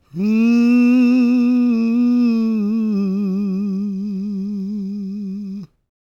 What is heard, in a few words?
GOSP MALE, SOLO; Gospel